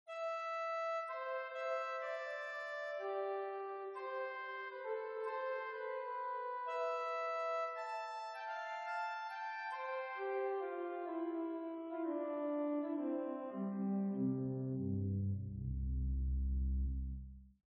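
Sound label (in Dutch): octaaf 8, orgel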